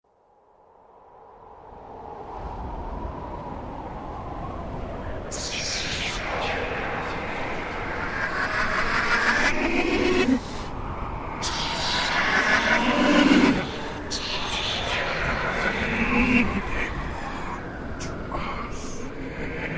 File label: Laughing Man Wind Reverse; Ghost Whisperer